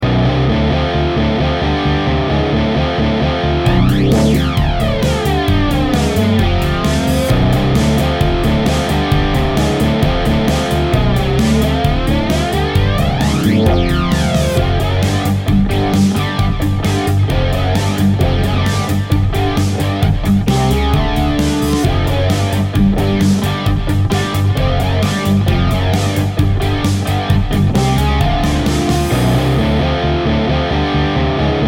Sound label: Flanger; Mikron Flanger